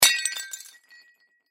Crash Ceramic Bowl Dish Drop Break; Crashes (Sound Effects:Objects); Blastwave

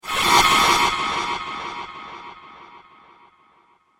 Vampire, monster sound; The Vampire Strikes Back; horror

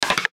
button clicks; Multimedia

